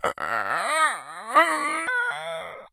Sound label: moan, infected; npc; idle